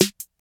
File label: sparkly rap snare sound g key, snare sample tuned to the G note; snare drum samples